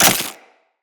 Melee Impact Glass

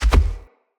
Gallop Default, Animals, Foley, Steps; Bromius

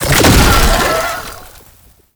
Roll Start; Infested Oni

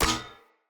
Grab, Swords; Weapons; Player; Mockery Weapon Common

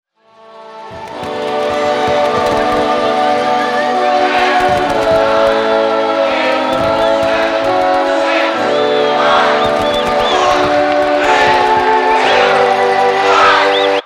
Nuke Scene; Warframe; NineteenNinetyNine

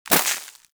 Bullet Impact Debris Snow; Warframe; Weapons; Bullet Impacts

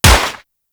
Uzi Shot; Tenno

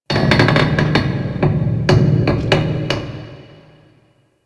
Ice Metal Creak, Ambience; Warframe; Corpus Ice Planet

